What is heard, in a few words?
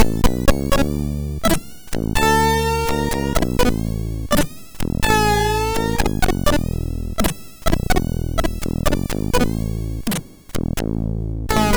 Country, sid MOS6581R2